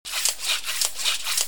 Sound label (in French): Pompe à velo; bruitage; Outillages